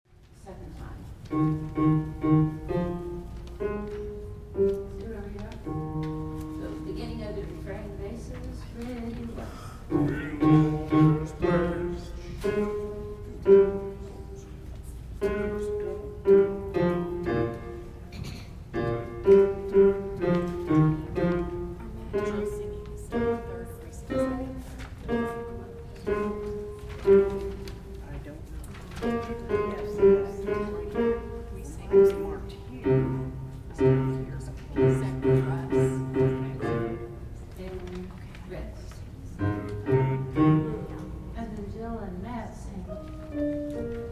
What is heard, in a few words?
bass on doot